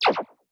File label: scifi weapon synth blaster